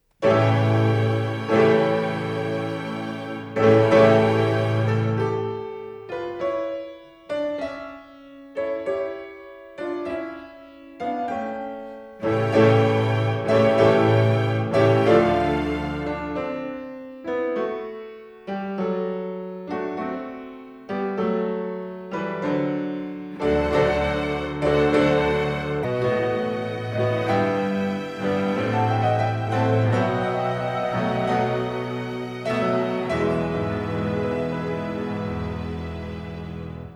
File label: Concerto for 4 Keyboards in A Minor Largo; Michel Dalberto